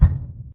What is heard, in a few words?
footstep jog; Titanfall 2